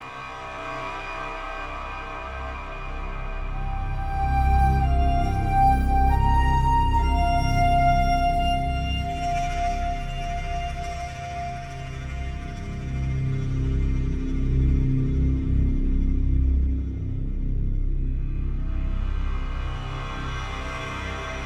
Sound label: Inside Ambient Cave